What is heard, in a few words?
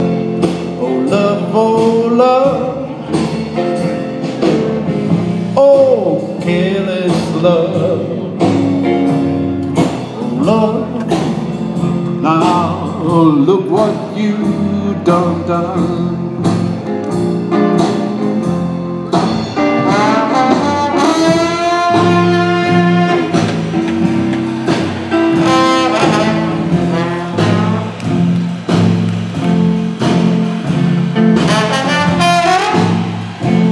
random jazz